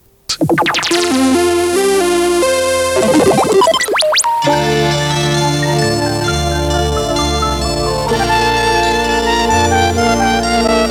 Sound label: sun Shine Jingle; SunshineJingles